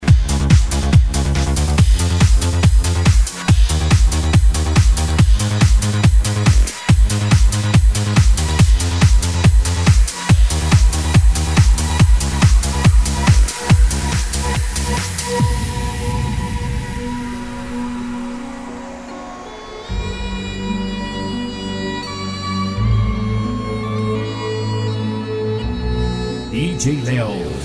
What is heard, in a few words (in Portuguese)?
dance sertanejo remix eletro funk e pancadao; Studio 13